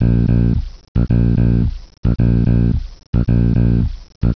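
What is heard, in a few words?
Groove 110 Bb, Bass; Sonic Foundry (Sony Creative Software); Crimson Blue and Fabulous Horncraft 4 RnB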